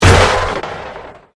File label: Convolution Pulse; Sound elements to create more complex sound effects; Swell Sound Effects; Swells (Sound Effects:Sound Design)